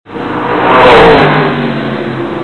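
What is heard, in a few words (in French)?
Moto à grande vitesse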